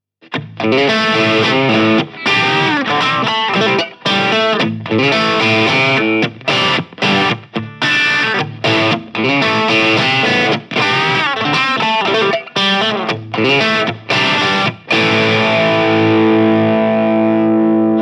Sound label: Engl R606 Ironball Head 20 SE Hard Crunch